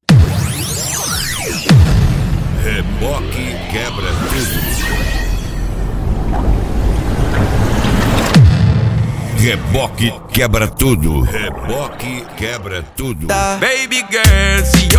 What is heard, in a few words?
reggaeton; Reboque Quebra Tudo Especial Reggaeton